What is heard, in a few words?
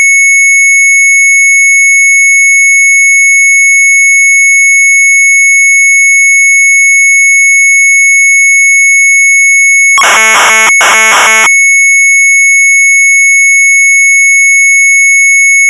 Sharp MZ800 Tape